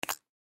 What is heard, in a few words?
Pen Take Lid Off; Office; Blastwave